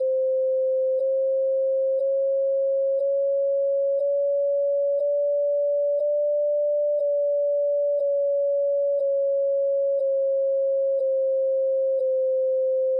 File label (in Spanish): do re do sextos tono 1 parcial